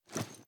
reload empty fast cloth; reloads; gmod; iw8

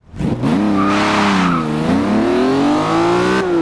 first, vehicles; bmwm3gtr

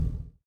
stop, vehicles; dippy

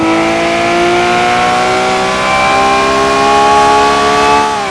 third, vehicles; gmod; tdmcars; murcielago

